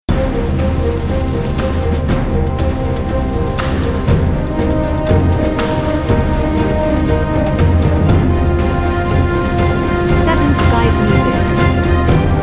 Battleship Return

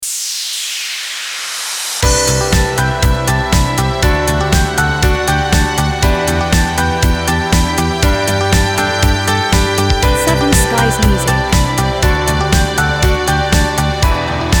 Good Luck Ident

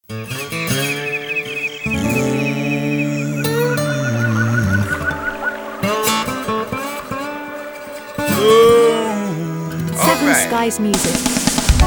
Open Country Theme voice

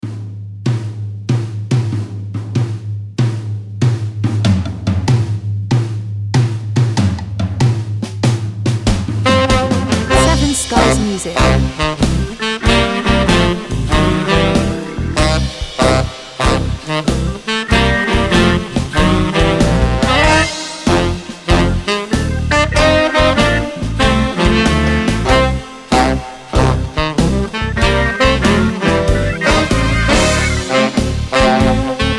Swing

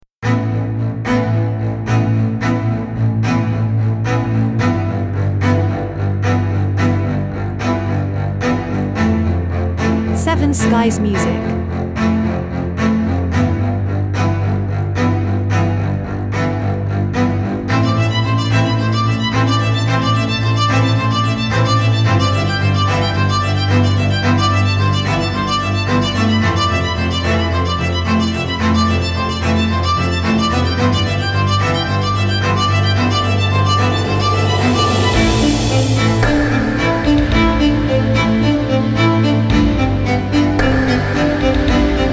Staccato Strings Theme

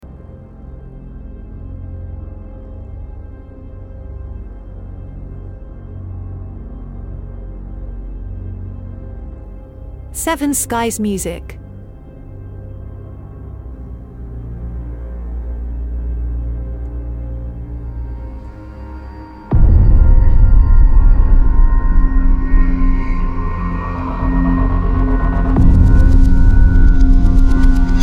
Ambient Dark Film Series

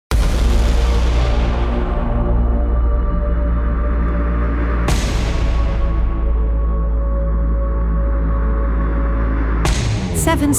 epic movie trailer

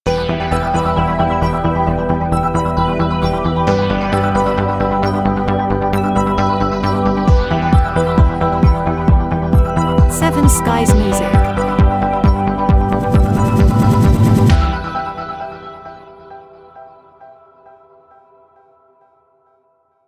Crescendo Stingout

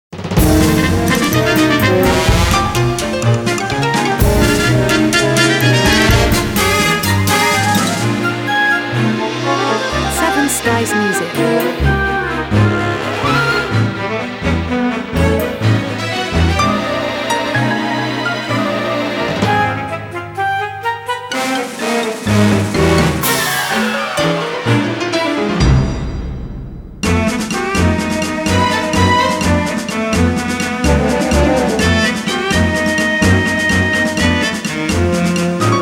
Big City Jazz

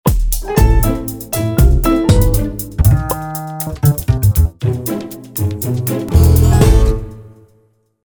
Christmas comedy Stinger

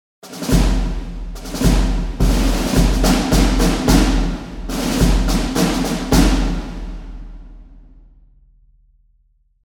Big Cinematic snare drum

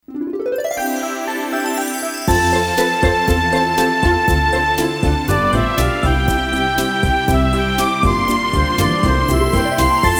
Natural products commercial

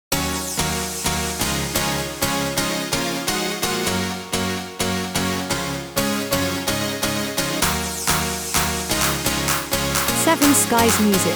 Hardcore Happy

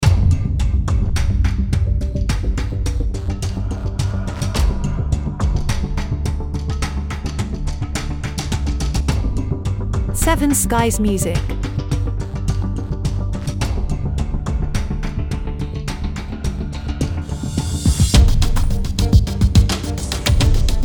No Staccato String Stabs

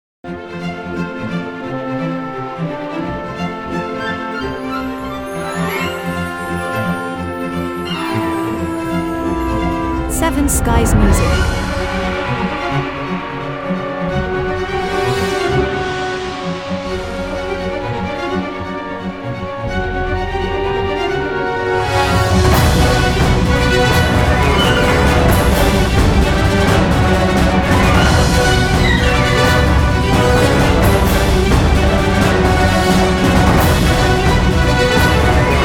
Epic Canto

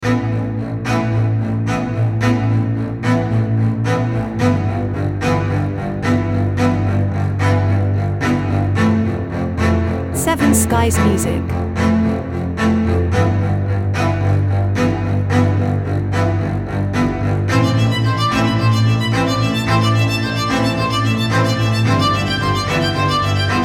Staccato Strings loop

